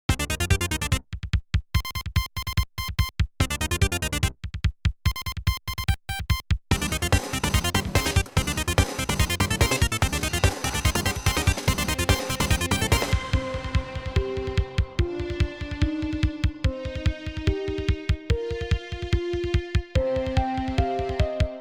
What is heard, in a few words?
inst